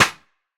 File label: Clap